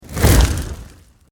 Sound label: Fire Fireball Pass By Heavy Crackle Whoosh; Flames